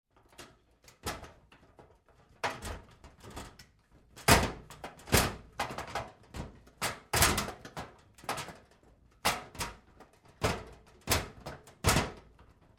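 Door Locker Handling Moves close-up Med, Metal Door; Doors; Big Room Sound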